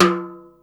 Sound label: timbale rim, Yamaha Motif ES drum machine; 300 Drum Machines; Yamaha Motif ES-Series